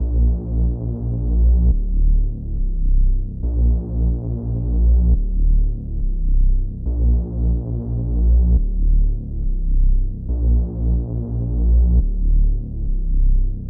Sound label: Bass, 140 BPM D Min; Loop Stems; Infinity Melody Collection; Cymatics - Candy